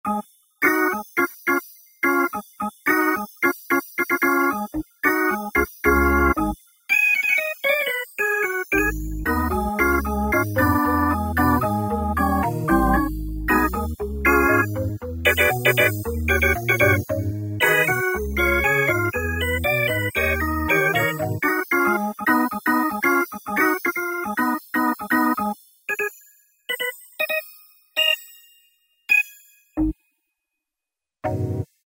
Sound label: White Organ; Generalmusic S2R